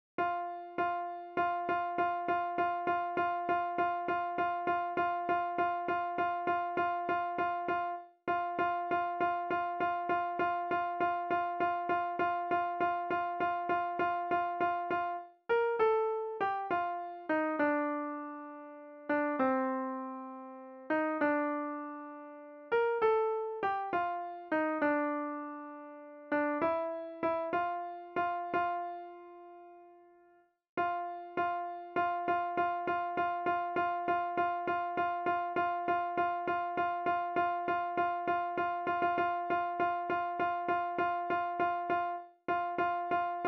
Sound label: Lead Alone